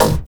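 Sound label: snare drum, Drum & Bass, 160 BPM; Ueberschall; Drum & Bass Flash Trackz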